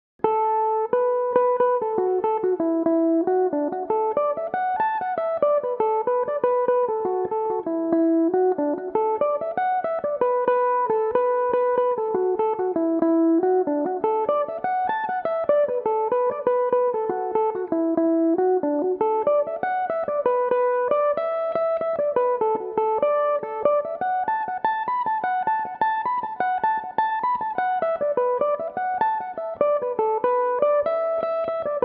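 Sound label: B minor, irish mandolin, tunes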